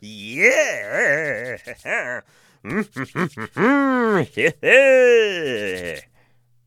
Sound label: Male Cartoon Pleased With Hands Chafing